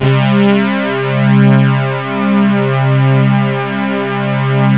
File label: 5th; jazz jackrabbit 2; M8